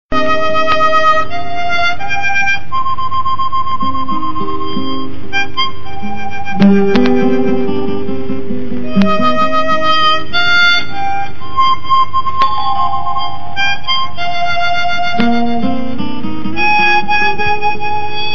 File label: Mouth Organ; Sholay